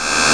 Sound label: Exclamation; internettheme